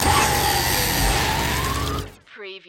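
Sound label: Production Element Hit Sweeper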